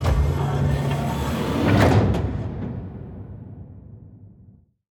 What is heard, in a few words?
silo clamps on; factorio 2.0.55